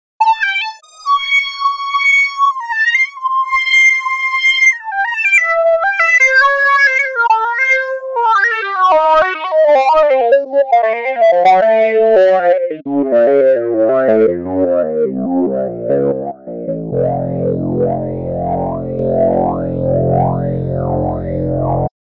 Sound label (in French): exemple brass; physical music; time freezer